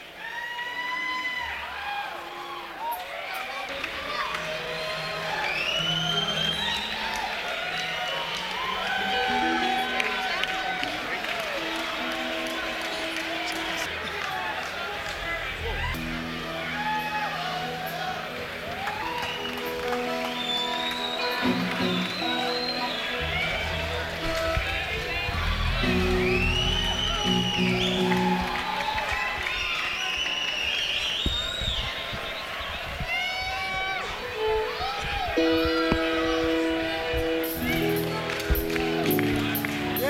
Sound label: Crowd Tuning; senn421